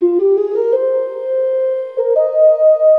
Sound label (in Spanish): OCARINAS; multitracks